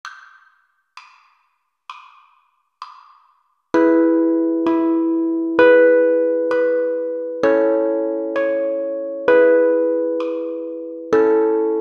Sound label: Twinkle Star 65bpm, uke